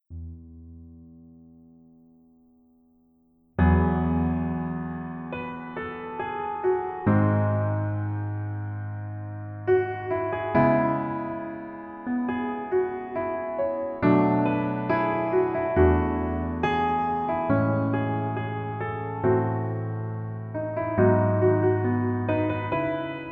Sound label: Piano